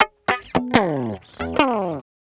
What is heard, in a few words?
Guitar Licks; Sonic Foundry (Sony Creative Software); Ambient Grooves; Guitars